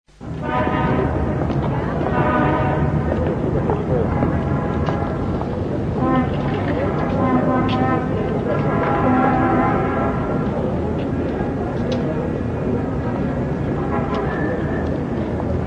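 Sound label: sounds of city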